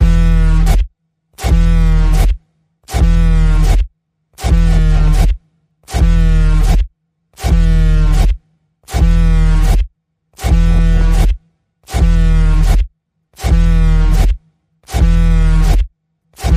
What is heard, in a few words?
Panning Pulse E, Key of E